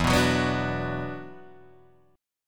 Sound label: E 7th Suspended 2nd, down, Guitar, Open D